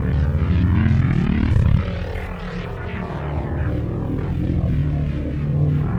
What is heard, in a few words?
Static Arp; Sonic Foundry (Sony Creative Software); Ma Ja Le Ethereal Textures; Noise Percussion